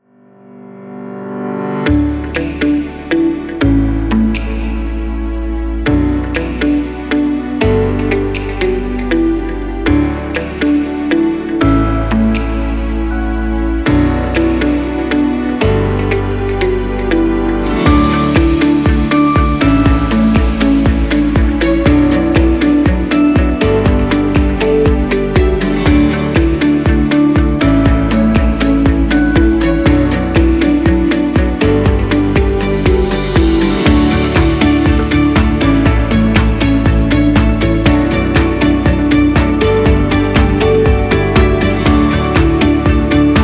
Corporate Advertising; urbanniAudio

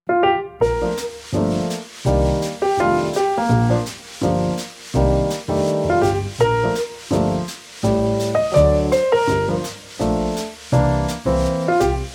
Raw Vibrations Jazz Piano Trio

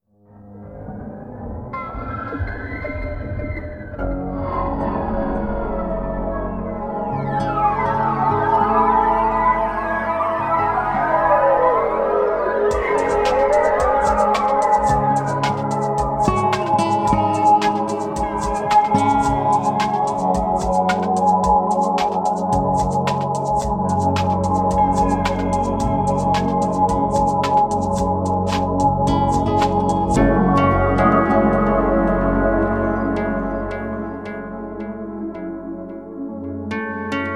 Chillin Guitar Trippin Mix

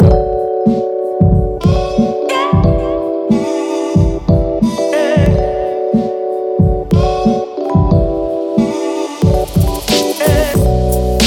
Boom Bap Vlog Hip Hop Beat; UrbanGodzilla

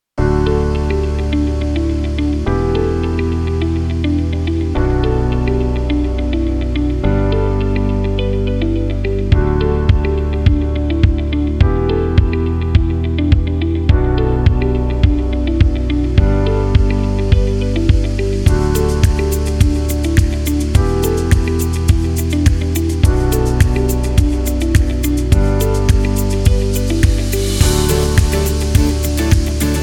Inspiring Beautiful Ambient; YuraSoop

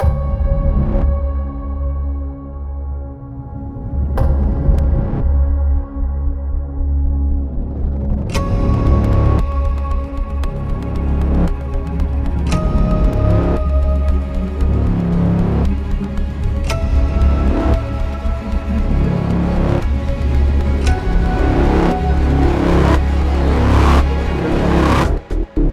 Thriller Breathing; soundside; royalty-free music

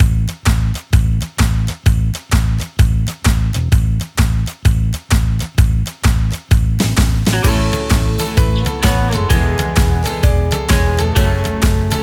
Uplifting and Motivational Pop

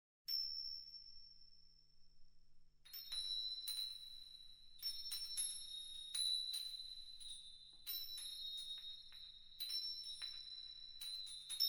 FOREST III from ASIAN DRUMS; HasenChat Music

